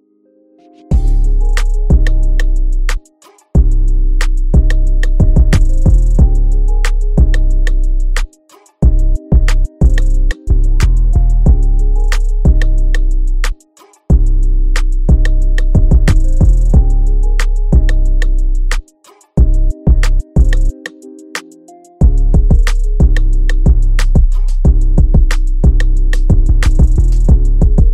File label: Instrumental